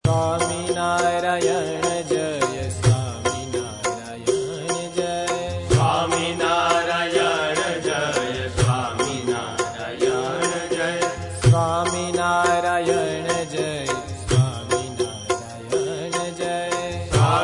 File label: Dhun; Swaminarayan